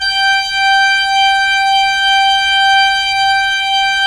ORG P. ORG; Roland - LA Composer vol. 1

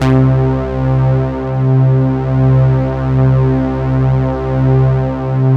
C2 prophet pad; Sound Gallery - Charged

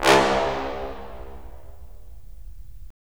BRS, Roland LA Composer

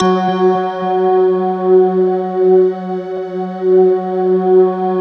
bell choir, slow bell choir; Optical Media International - Sonic Images Library

